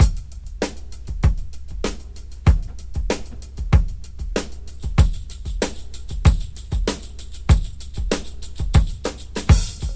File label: 96 bpm drum loop A key